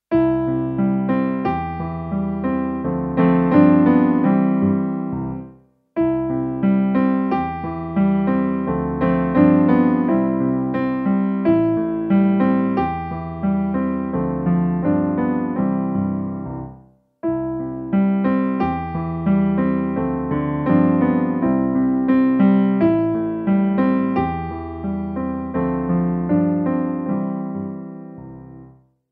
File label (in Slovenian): note klavir